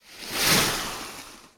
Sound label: rain splash; Blind Drive